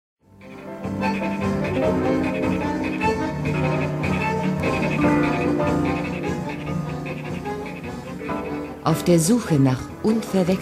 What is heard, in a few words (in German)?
Hoerprobe Tango; hamm